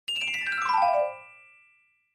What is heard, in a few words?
Clavichord Magical; keyboards